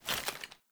Foley Action Armour Walk; Player; MotherArmour

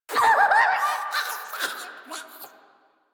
Death; Grineer Jester

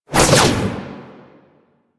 Backflip Whoosh; Foley

